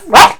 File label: Dog High; Animals